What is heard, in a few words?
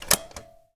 police radio button; DeadFright